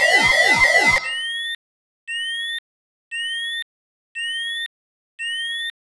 shield low, vehicles speeder; Quake 4 Alpha

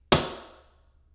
table foley

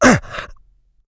pain light, player; Doom

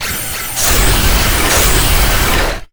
valkaryne leap attack; Quake 4 Alpha; id software; strogg

